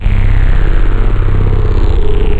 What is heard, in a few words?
engine; JavaGameUebungen; slick